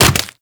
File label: Sword And Whip Finisher Puncture; Warframe; Weapons